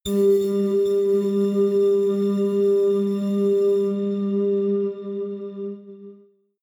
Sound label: synth hum echo high, drones